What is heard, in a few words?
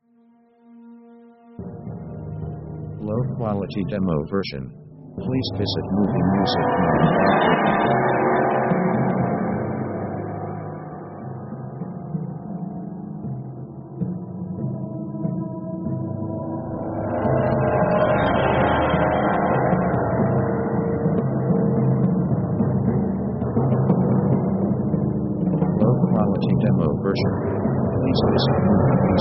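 orchestra weird ghostly; Dark mood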